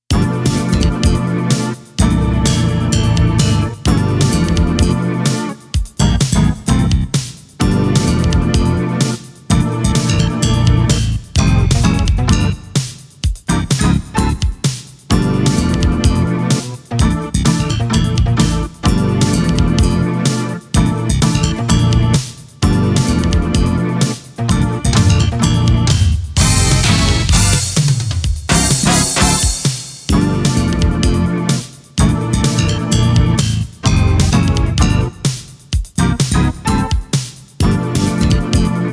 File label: Roland SC-55mkII